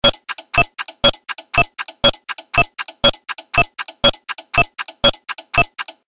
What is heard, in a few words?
clock sound; sentry buster